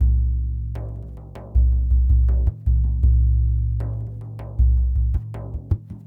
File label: Lg Frame, World Percussion